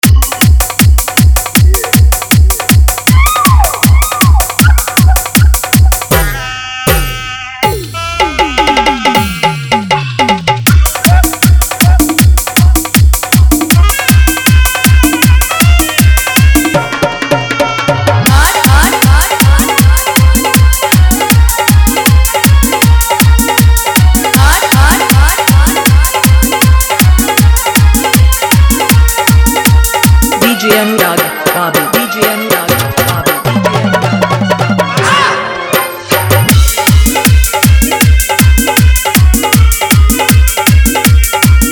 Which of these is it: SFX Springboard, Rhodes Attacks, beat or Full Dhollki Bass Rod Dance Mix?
Full Dhollki Bass Rod Dance Mix